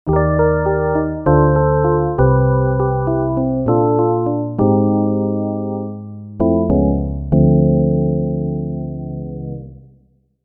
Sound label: Soft Keys; Elektron Digitone